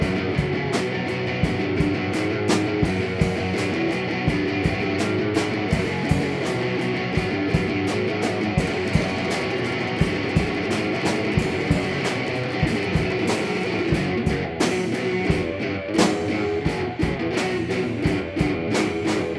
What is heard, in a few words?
Metal Riff